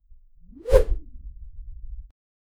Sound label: wosh swing wind wipe; movement